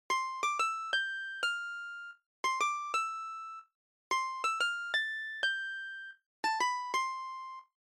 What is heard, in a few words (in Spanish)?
Guitarra Escrita